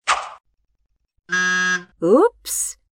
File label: wrong shoot